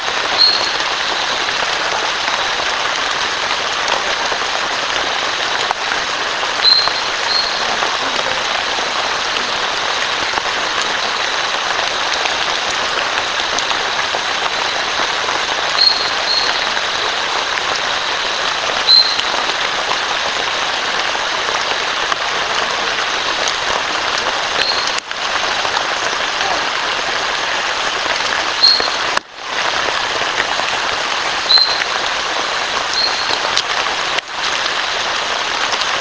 Hyalinobathrachium fleischmani, centrolenidae; Hialinobatrchium fleischmanni

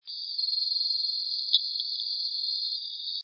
Craugastor alfredi

